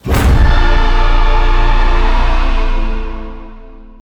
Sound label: Challenge Complete Stinger